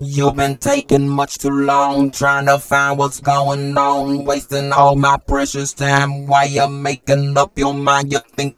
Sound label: ROBOTRAP, funky vocals, rap ragga; USB Soundscan vol.18; AKAI